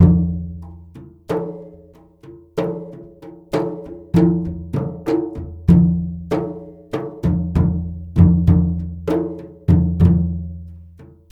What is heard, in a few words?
MLY DRMS; Spectrasonics - Heart of Asia CD2